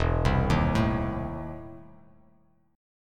E Major 7th Suspended 4th Sharp 5th, Bass Standard; EM7sus4#5 arpeggiated